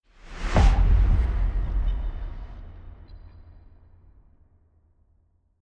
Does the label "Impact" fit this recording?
Yes